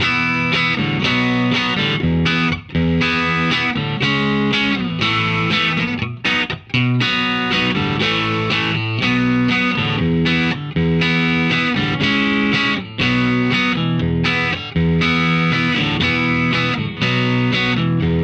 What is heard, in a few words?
solo